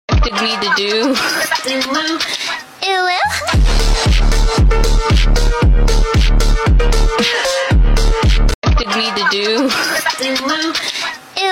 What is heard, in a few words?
Phonk Remix